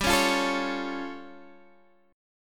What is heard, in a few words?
A Augmented 7th G